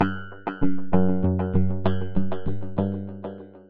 acidos

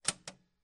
cajas registradoras

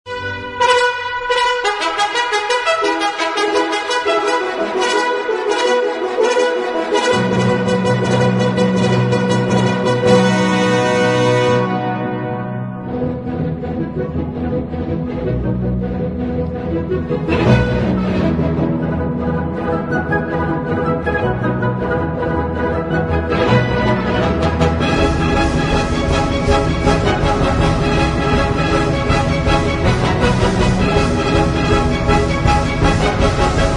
carrera caballos musica clasica hipodromo

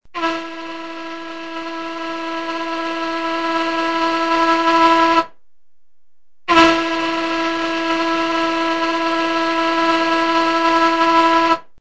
Barco bocina

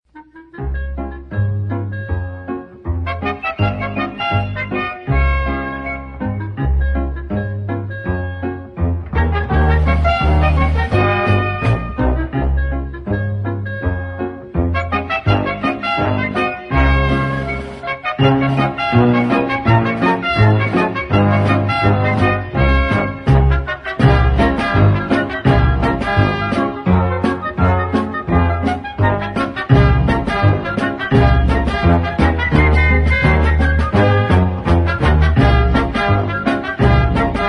cortina musical dibujos animados

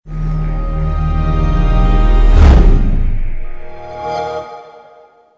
separador musical

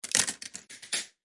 tragaperras tragamonedas

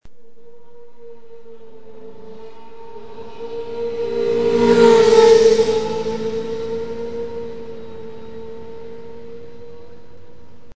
Carrera

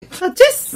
estornudo achis

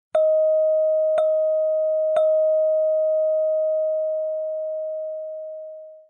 campana

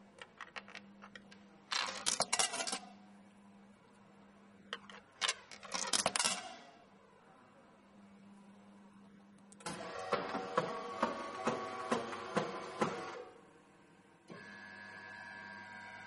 el cafe

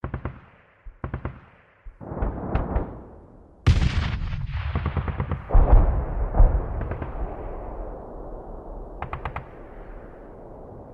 bombardeo

